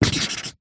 office marker pen highlighter luminous scribble fast short on paper